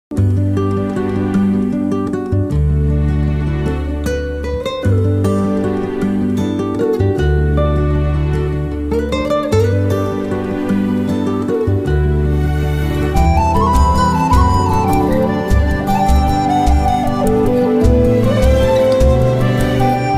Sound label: tamil love ringtone bgm ringtone